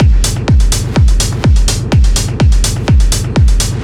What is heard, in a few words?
125 drum loops; DubbaLubb; M8; Electronisounds